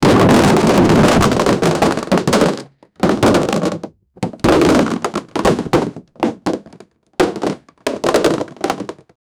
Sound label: a lot cardboard box falli